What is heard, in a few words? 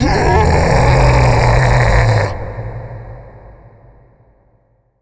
supermutant behemoth attack